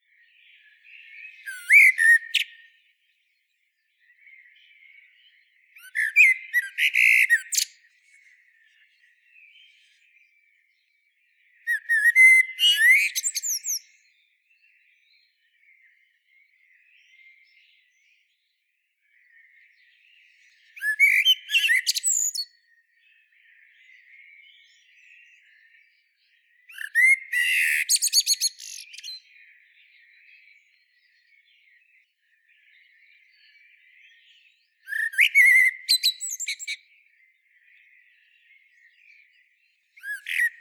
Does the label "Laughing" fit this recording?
No